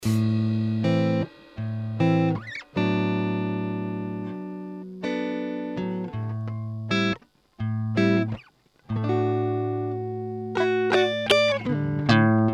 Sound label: chorus